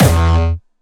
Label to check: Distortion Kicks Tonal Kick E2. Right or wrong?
Right